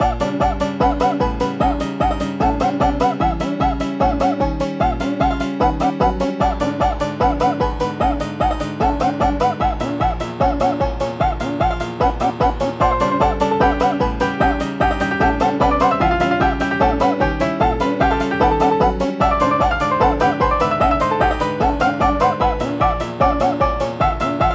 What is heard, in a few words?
a banjo, a piano, a flute and a mess